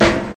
tight low end snare sample a sharp key, snare one shot tuned to the A# note